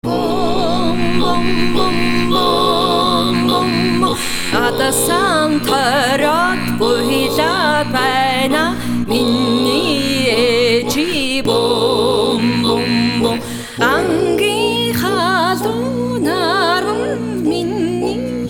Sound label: Mongolian